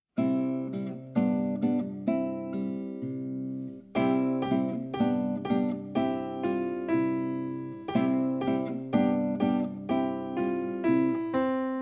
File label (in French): temps de lecture instrumental guide chant; Comptines